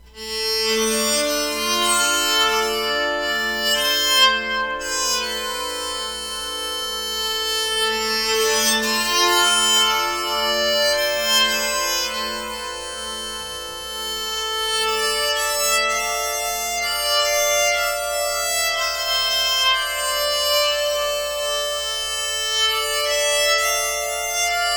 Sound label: amplified